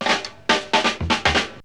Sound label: break 119, drum; BREAKS